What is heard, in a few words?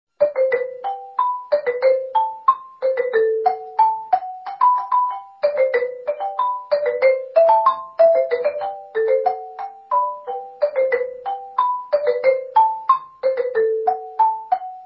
magic flute ringtone